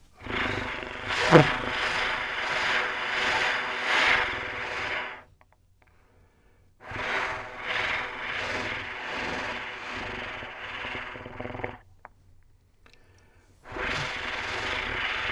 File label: bird